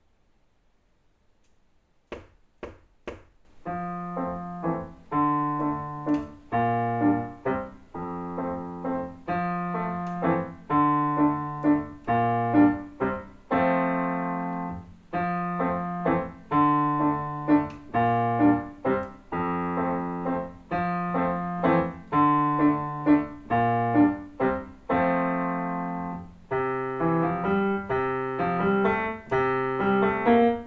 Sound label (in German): Menuett Begleitstimme; fuer den kleinen Koenig